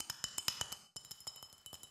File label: bottle, ethno, 125